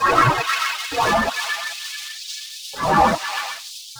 Tape Arp; Sonic Foundry (Sony Creative Software); Ma Ja Le Ethereal Textures; Noise Percussion

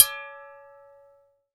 Glass Vase, household rhythm samples Hits

